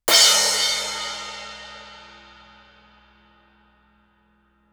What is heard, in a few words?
crash stick shoulder ff stereo, Suspended Cymbals; Percussion